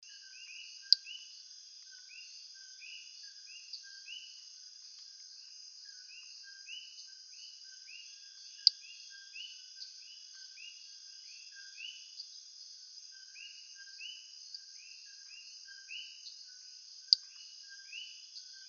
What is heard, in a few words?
E richmondi, coqui